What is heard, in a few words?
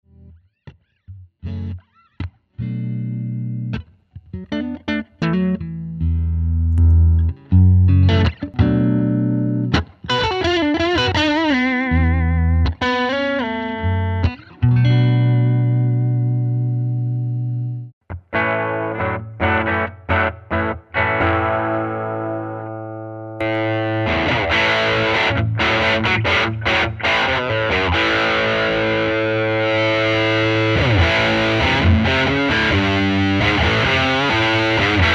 Fulltone OCD Pedal